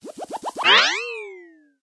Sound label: Sfx - Animal Grow